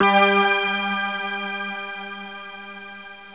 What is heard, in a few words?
KLICK